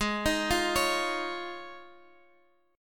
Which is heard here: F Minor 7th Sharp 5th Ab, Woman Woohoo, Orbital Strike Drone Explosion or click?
F Minor 7th Sharp 5th Ab